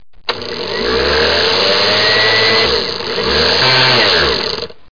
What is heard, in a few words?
blender